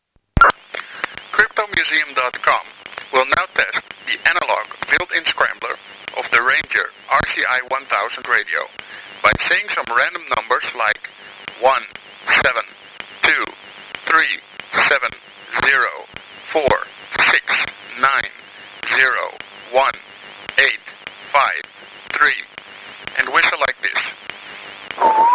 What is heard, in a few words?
descramble; rci 1000